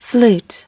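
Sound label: flute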